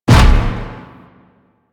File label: Void Dragon Orb Charge Explosion; Enemies; Abilities; Warframe; Duviri